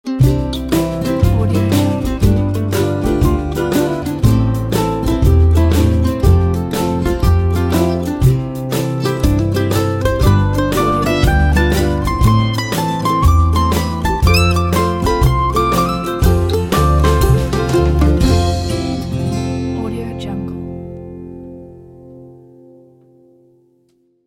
happy ident

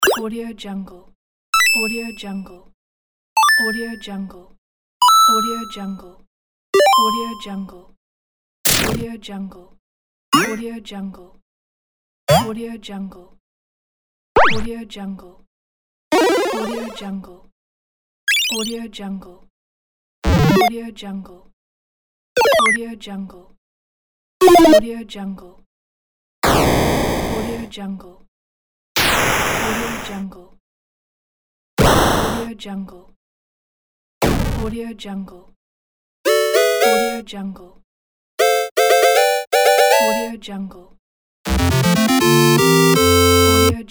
8bit game pack

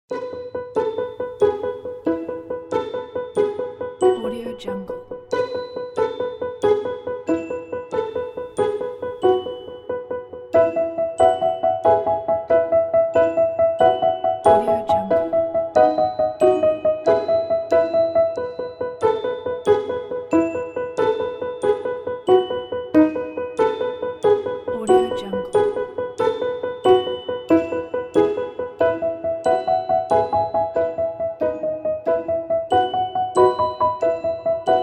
rain

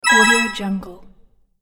collect bonus

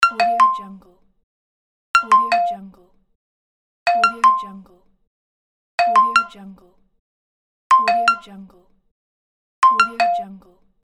notification

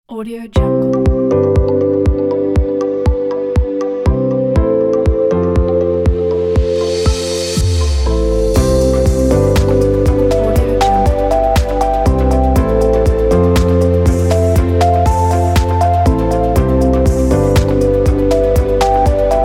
fashion background music

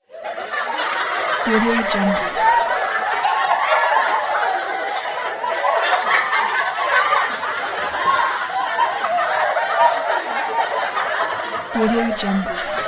crowd laughter

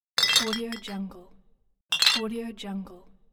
empty glass bottles

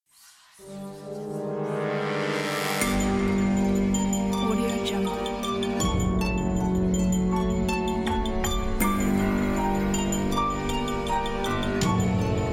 ascending horror theme logo

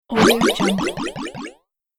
swipe jump fall

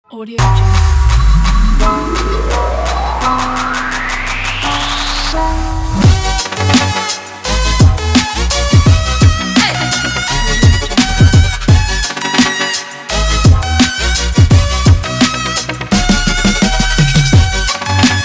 inspiring hiphop

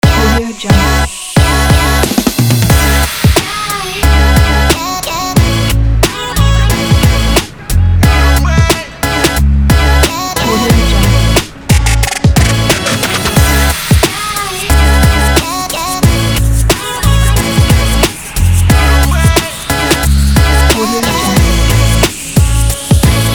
the hiphop pack

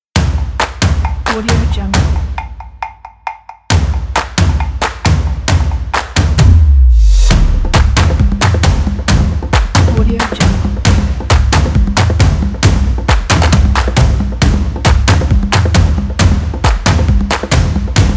action drums percussion; jet-sound

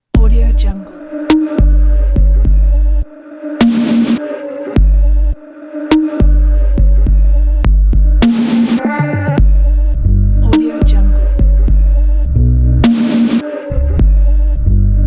fashion crunch beats compilation

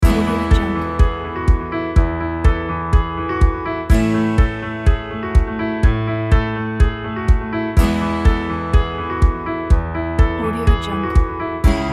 corporate run upbeat

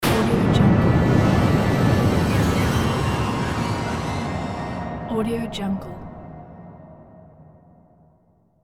science fiction whizz ufo effect; hollywoodedge

